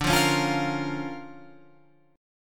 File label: D Minor Major 7th Sharp 5th, D, Guitar, Open F; 14,12,13,12,13,12; DmM7#5 strummed; JGuitar